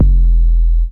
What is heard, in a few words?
808, F